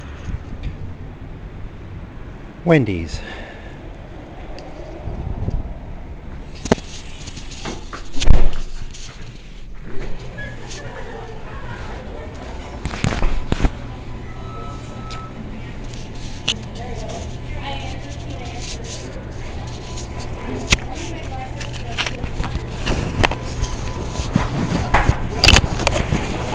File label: soundscape ordering lunch at wendys